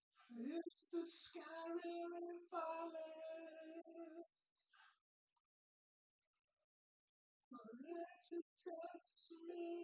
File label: nodrums synthconv; SiSEC08; SiSEC underdetermined